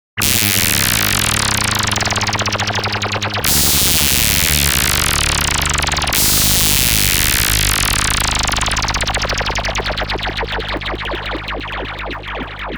MOOG MAVIS Dripping Wax with Stereo Tape Echo

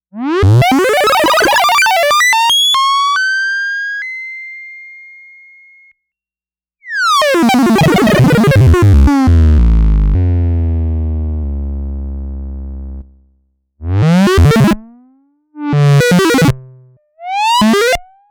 MOOG MAVIS REW FF Dry Signal

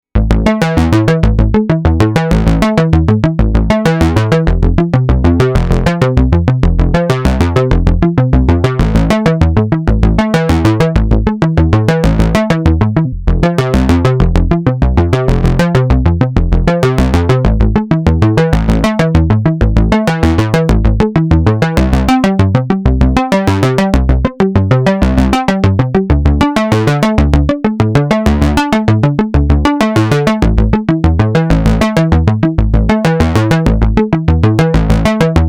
MOOG MAVIS FM BASS w RE 301 Stereo Chorus

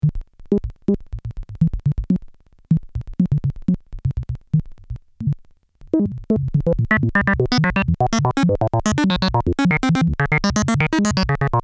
MOOG MAVIS Sequential Controller Dry Signal

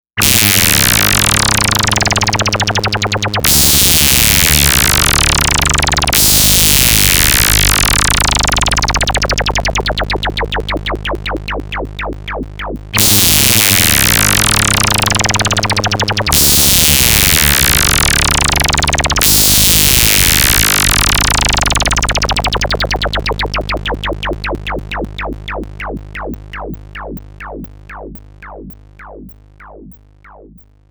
MOOG MAVIS Dry Signal; Dripping Wax

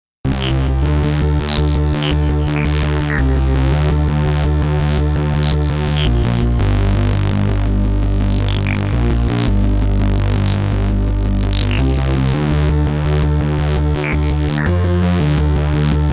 MOOG MAVIS w RE301 Chorus Echo

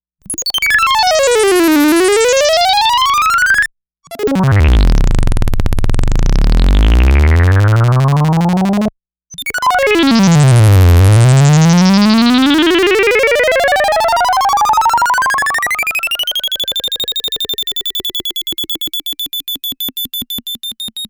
MOOG MAVIS Dry Signal; Rainbow Weaver

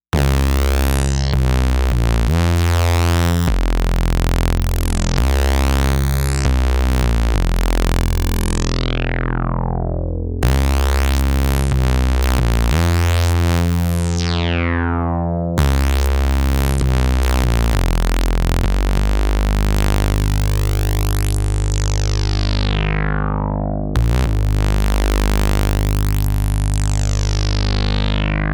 MOOG MAVIS Blown Out Dry Signal

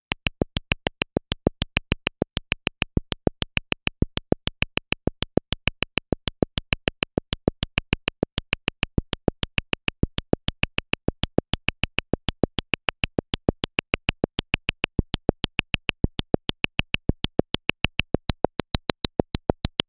MOOG MAVIS Mini Rhythm 78 Dry Signal

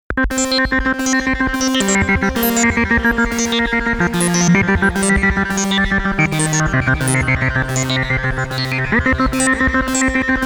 MOOG MAVIS Bubble Machine w RE 301 Tape Echo

